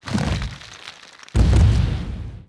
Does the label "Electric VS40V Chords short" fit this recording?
No